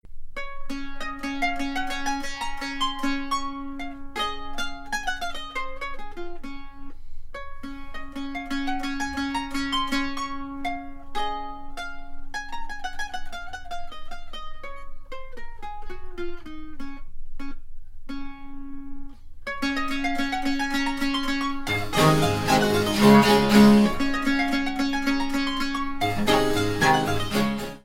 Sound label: SONATA 1 Re Majeur Presto en Rondeau